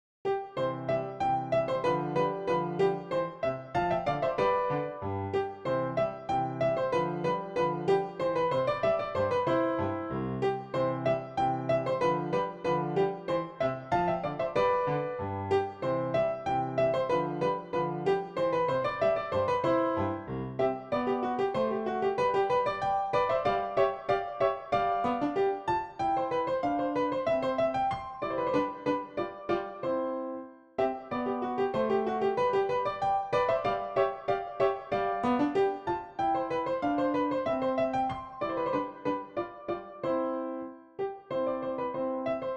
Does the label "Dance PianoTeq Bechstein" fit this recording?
Yes